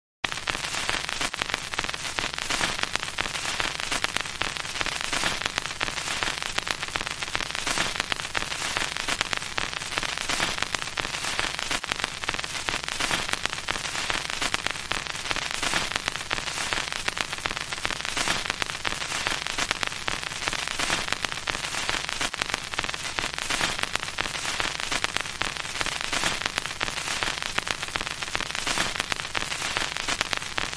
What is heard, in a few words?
Radio Crackle